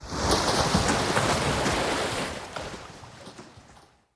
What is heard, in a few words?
Corrupted Sap Waterfall Start; Puzzles; BeanStalk